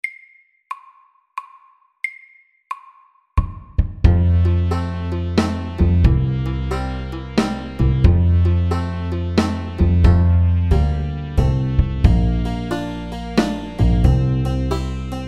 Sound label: Country shuffle SAKTE